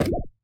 symbol Stop On Ground; magic maid cafe

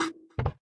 quick Stop; twin spin

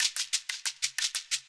free Spin Intro Splash; gorillakingdom

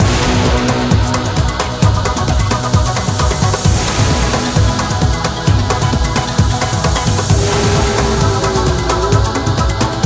free Ambience; gonzosgold

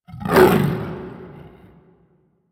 tiger Roar; templeofnudges

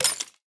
button; vegas night life

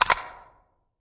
chocolate wheel button; koi princess

